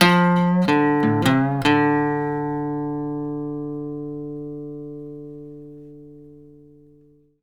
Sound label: licks, Blues Guitar; ILIO; Fretworks